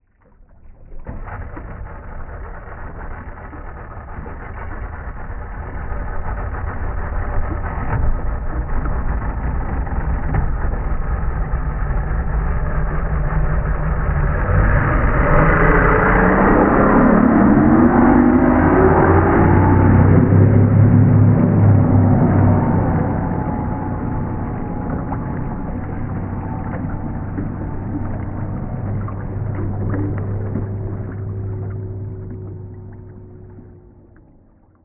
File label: powerboat startup